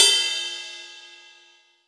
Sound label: Hats Real Open